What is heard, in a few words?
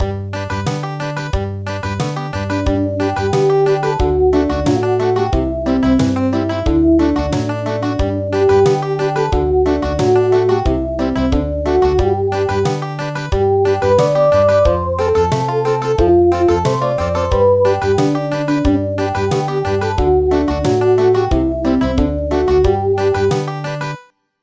Country